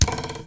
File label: arrow hit; minetest; x bows